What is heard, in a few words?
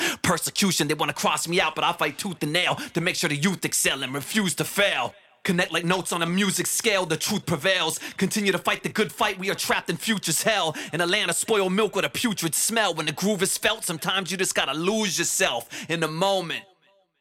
crucified vocals deedger mit; TDR Kotelnikov GE Nova GE